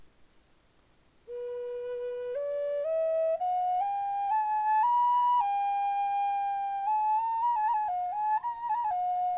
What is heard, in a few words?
cedar middle b, flutes